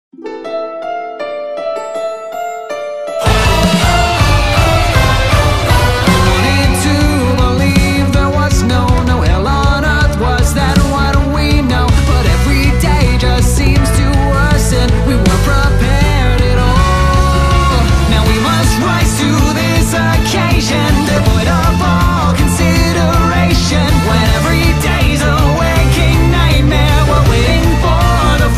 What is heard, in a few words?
English Dub Cover